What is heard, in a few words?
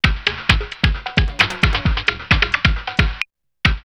Sweet Samba 133, drumloops, Rave; X-Static Goldmine; 90 s Sample CDs